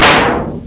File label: Trash; fight